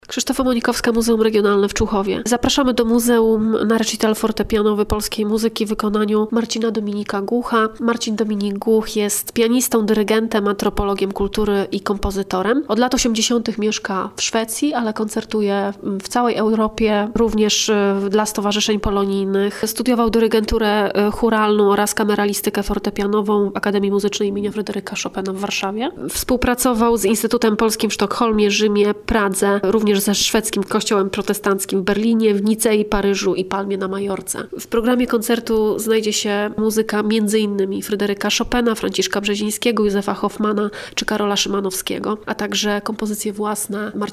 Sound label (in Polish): koncert fortepianowy